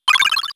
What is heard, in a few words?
item drink; SNES; SMRPG